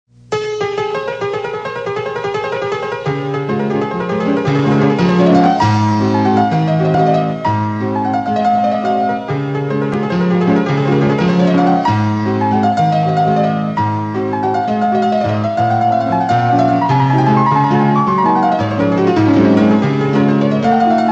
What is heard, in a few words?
Piano Samples